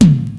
Fairlight CMI III Tom

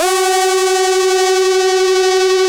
700 F#3, Korg; Back In Time Records; Korg Universe VOL-1

